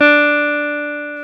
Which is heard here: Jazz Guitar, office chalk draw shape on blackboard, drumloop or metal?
Jazz Guitar